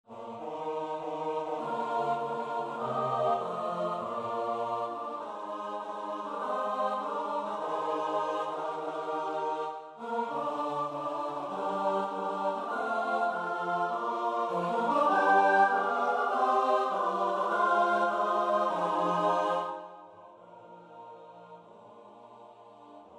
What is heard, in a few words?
midi; blogoslaw nas